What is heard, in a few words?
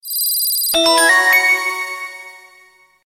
Oppo Notification